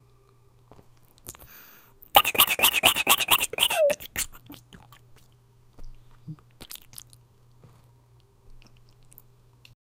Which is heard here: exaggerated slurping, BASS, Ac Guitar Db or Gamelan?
exaggerated slurping